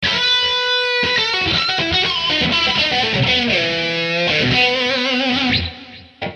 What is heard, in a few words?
122 lead